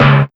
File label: Ragga & Dub Reggae; USB Soundscan vol.27; AKAI